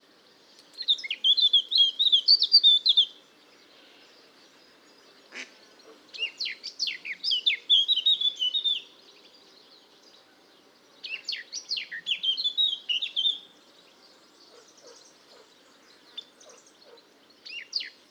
whistle only

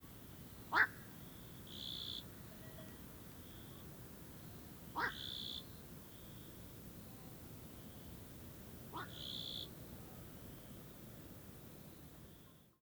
1st call

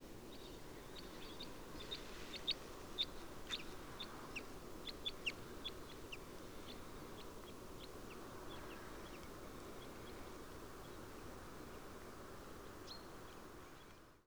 flock

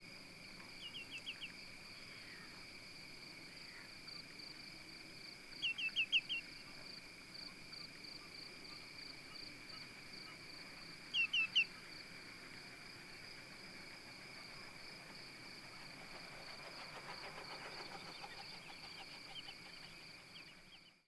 nfc faster higher

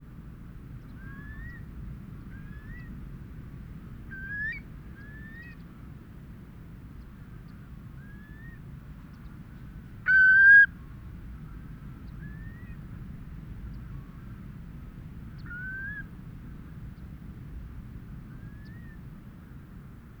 long low

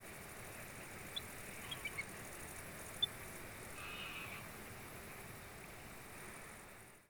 pik nfc

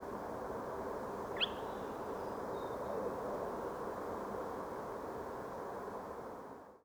bird very good, Garden; Chafford Hundred

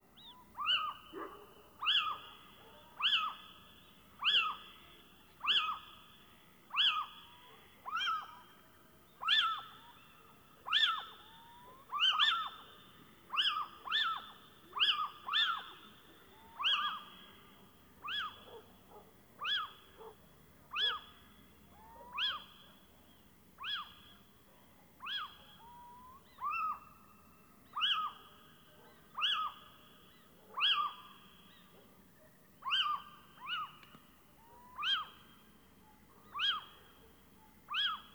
Little Owl Aggressive song of at least four

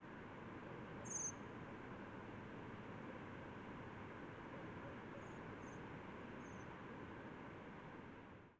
single diverging

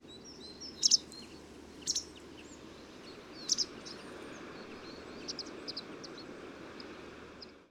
White Wagtail Flight Calls

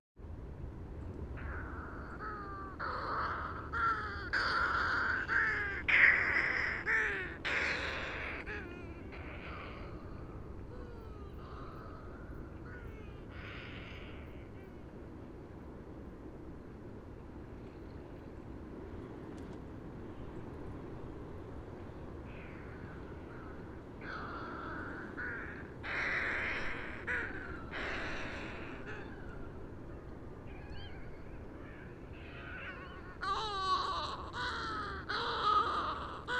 Yelkouan Shearwater

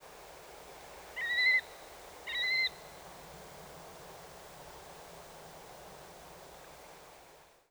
wail nfc

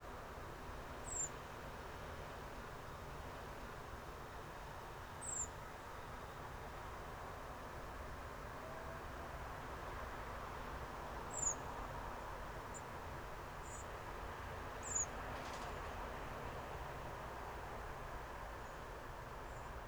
single band

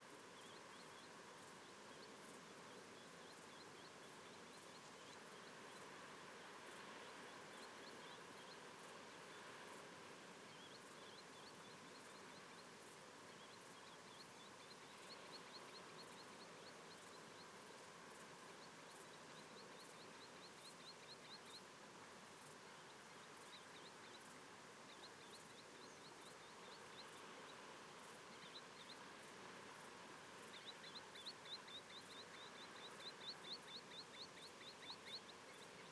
Green Sands singing one with lower pitch calls; Portland

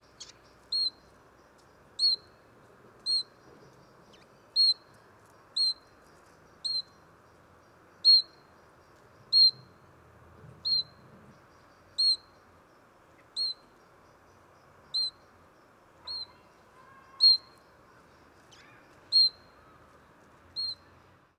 Siberian Chiffchaff Contact Calls

